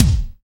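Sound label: bass drum; AKAI S6000 CD-ROM - Volume 1; DRUM RYTHM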